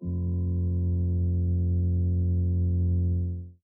zombies, Synths

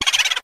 laugh chipmunk; ooh ahh

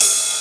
Hats Electro Open

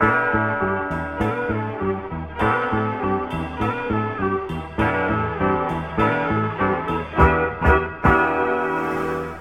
horns too fast, phonky loops

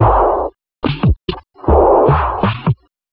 beats, drum loops

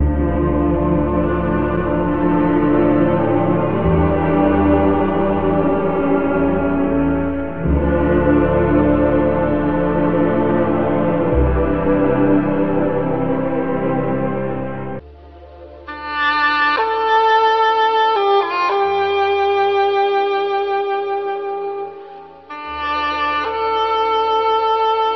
sax lonely again, Sad; Loops

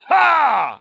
ha

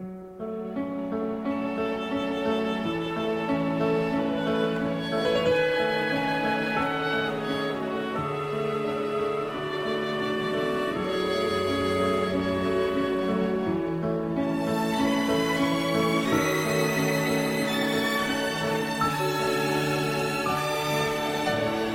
piano, Sad Loops

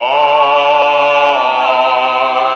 voice two notes descend; Loops; Sad

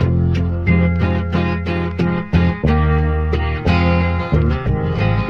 guitar, Loops Phonky

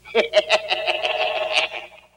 laugh old man; ooh ahh

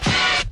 splat

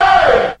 ay group of men, voice ooh ahh

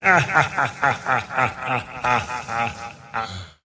laugh lucifer; ooh ahh

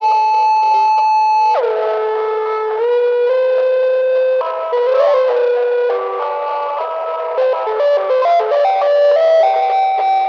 feedbacky, Electronic Loops

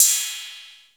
victory, Hats Electro Open

